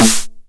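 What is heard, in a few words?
909 SD Tape, snare drum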